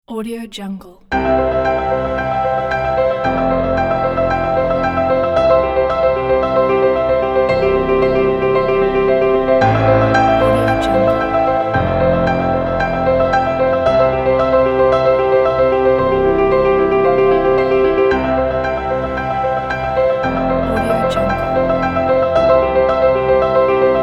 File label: beautiful allegro piano